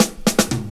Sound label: 120 fills; Sound & Vision - Gigapack I